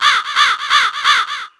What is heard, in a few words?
crow echo; zingaro song generator